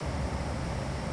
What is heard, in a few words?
Jetpack Idle